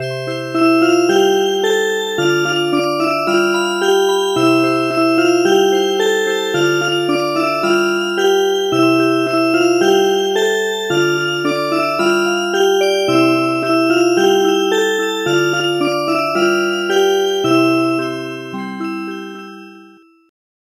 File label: Music Box; El virolet